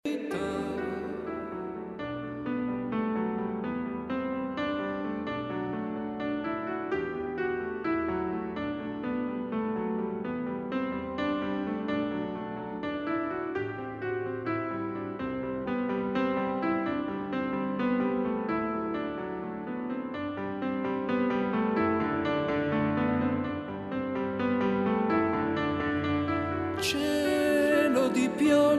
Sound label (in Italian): Canto